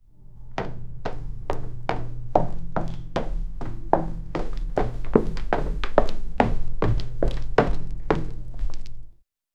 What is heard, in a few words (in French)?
un pied se pose sur, footstep